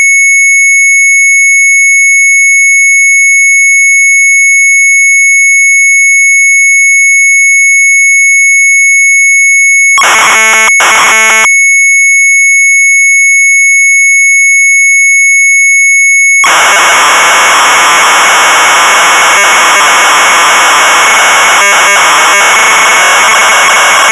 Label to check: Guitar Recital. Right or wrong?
Wrong